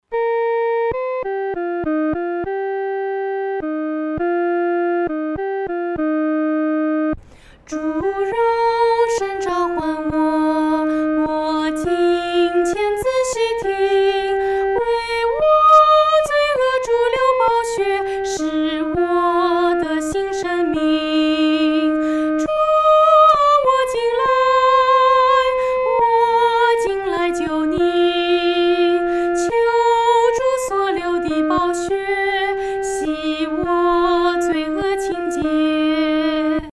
独唱 第一声; 主，我来就袮

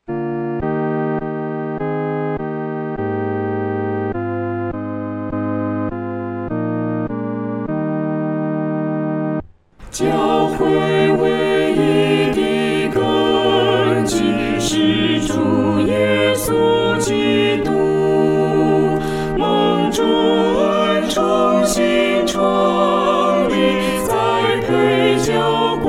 教会维一的根基 合唱（四声部）

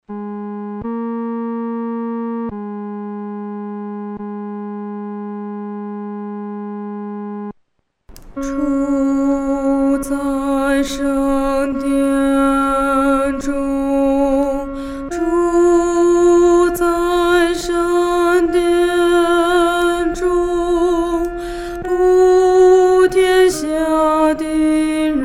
独唱 第二声

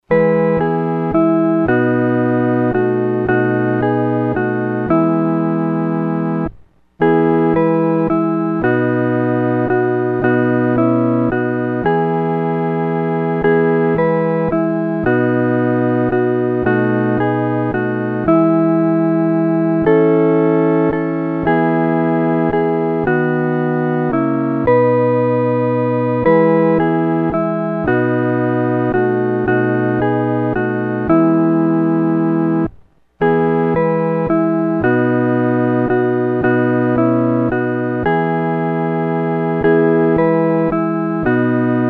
合奏 四声部; 奇妙圣经

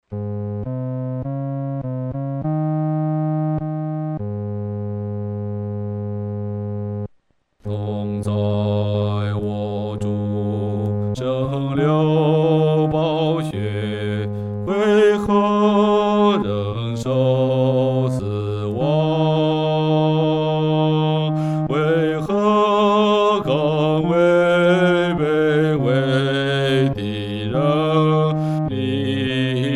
独唱（第四声）

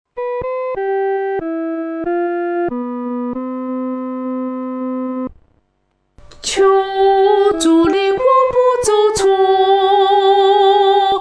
独唱 第一声

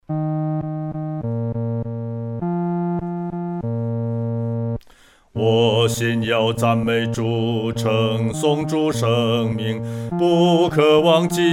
独唱 第四声; 赞美主恩

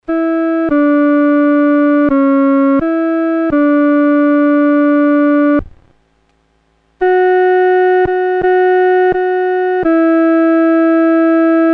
独奏 第一声; 垂听我祷告

